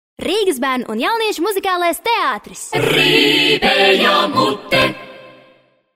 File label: acapella